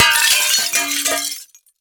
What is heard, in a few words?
Glass Break Pane; Glass Smash Full; Soundopolis